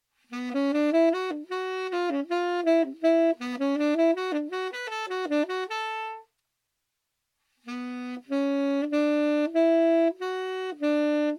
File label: tempo slow slow